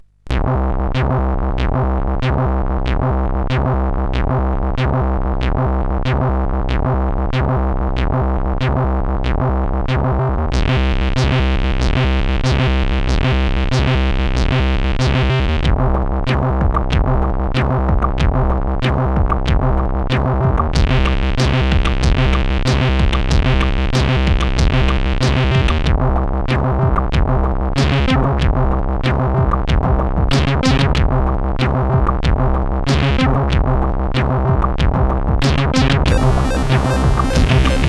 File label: ambient, CSG8580R5; Boobsvinoambient